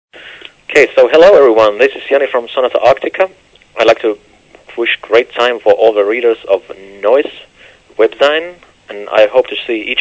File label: message sonata